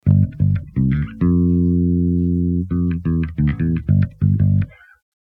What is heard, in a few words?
bass